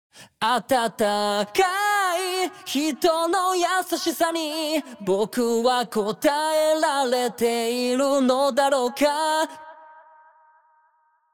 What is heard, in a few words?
vocal demo on